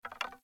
Bow Wood Rattle; Weapons; Player; Bows; Envoy Hunter Bow Ranged Melee

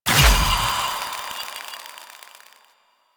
Fairy Flight Impact; DE; Warframe

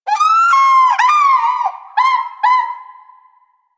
Bird Lure Call; Warframe; Hunting Critters; Sawgaw

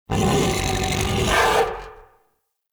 Battle Dog Enemy Spotted; Animals; Vocals

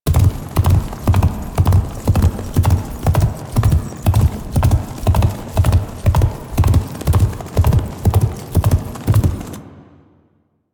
Unyielding Gallop Hooves Loop; Warframe; Dagath

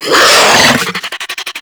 Infested Charger Attack, Enemies; Warframe

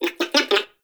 Baby Vocal; Nokko; Warframe; Nokko Colony; Find Nokkos

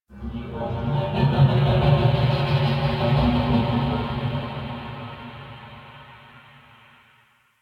Chimera Main Room Creature One Off; Ambience; Warframe; DE

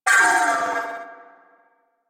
Death Vocal; Cinematics; Shrine Intro; Sentient; Warframe; Sacrifice

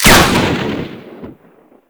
Carrier Sent Shotgun Prime Fire, weapons; DE; Warframe; Sentinel

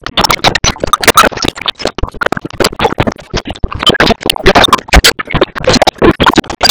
Eruption Burst; Deora Wake To Garren Eruption

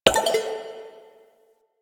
Messenger Window Close; Ambience; Warframe; Nineteen Ninety Nine

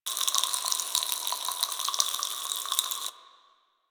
Infested Bulb Sprites Loop; Ambience; Props; Warframe; Corpus Infested

